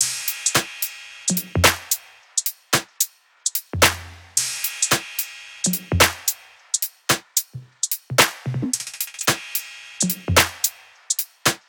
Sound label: loop top 116; fides; Seismic Loops; SEISMIC2